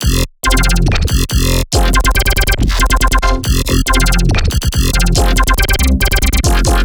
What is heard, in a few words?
Melodic Bass Loop F 140; Loops; Ghosthack - Color Bass Essentials